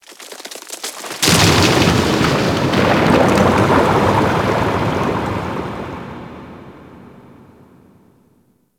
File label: Ocean Cave Crack Large, Ambience; Warframe; Grineer Ocean